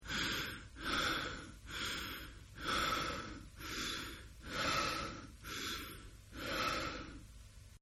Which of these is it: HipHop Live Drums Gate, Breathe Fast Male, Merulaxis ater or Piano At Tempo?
Breathe Fast Male